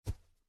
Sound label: Foot Step; Welcome to the Game